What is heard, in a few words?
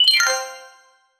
a futuristic digital, synth arpeggio